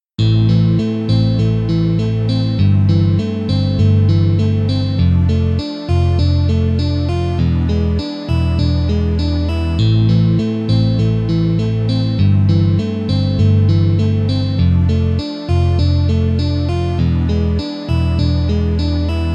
Slash akord Ami Amig Dmif E

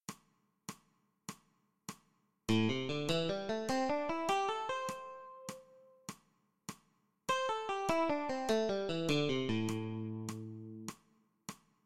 osminove trioly alternativne brnkanie; cvicenie

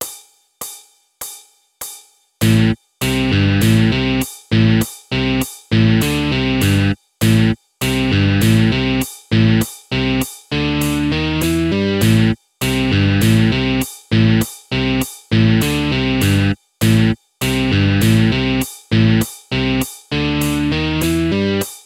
Motiv tempo 100

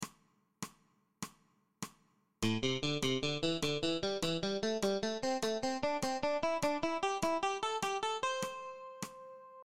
osminove trioly nahor tlmenie; cvicenie

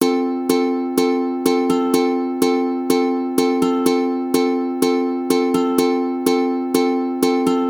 Rytmy ukulele tango

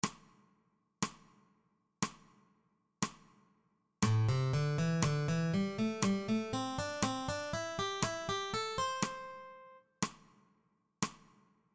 bluesova pentatonika

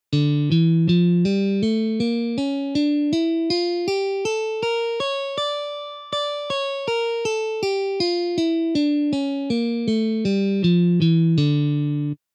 D harmonicka mol cez 2okt nahor nadol

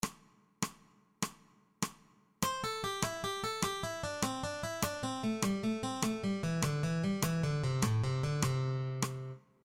osminove trioly zostupny motiv alternativne brnkanie; cvicenie

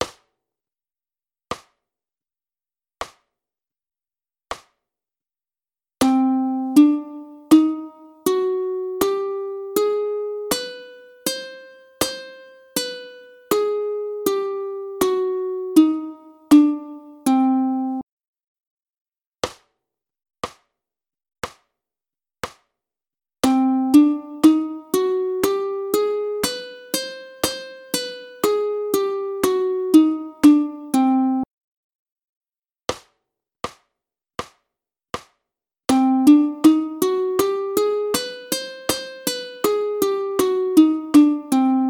ukulele stupnice ciganska mol

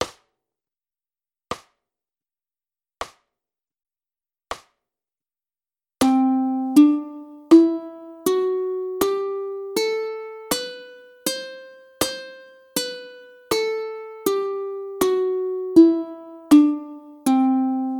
ukulele stupnice lydiicka 40 60 80 bmp

